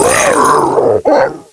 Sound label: Deer Attack